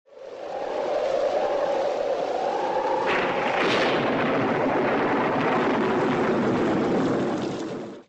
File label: Thunder FX; Halloween